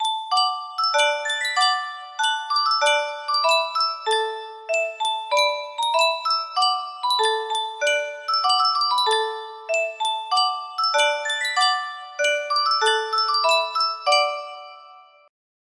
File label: yunsheng music box